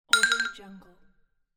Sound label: alert